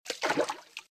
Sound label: Footsteps - Water - Ankle Level; RadwinIsland